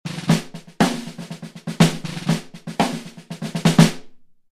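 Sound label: gretsch 2 tight over loose, Snare drum samples; Tight top head, loose bottom head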